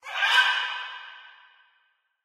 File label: macaw; Source Engine; tf; lair